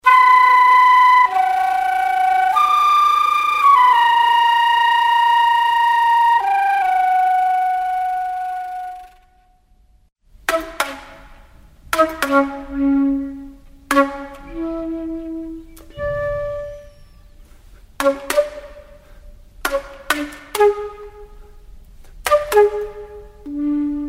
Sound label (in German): querfloete